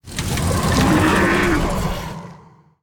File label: Sludge On Wheels Attack